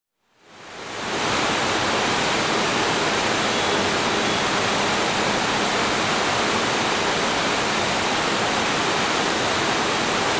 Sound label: Industrial Mixer Turbine noise